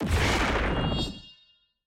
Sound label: sfx eye; samira